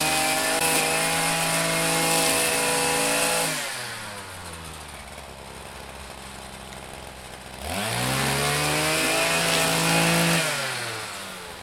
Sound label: grass trimmer